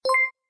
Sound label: bonfire